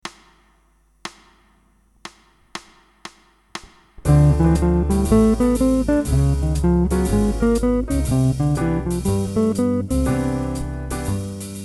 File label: guitar scale exercise example